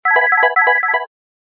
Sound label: Serene Tone; Samsung; SGH-P960